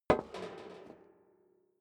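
step dress boots metal rattle single right, footsteps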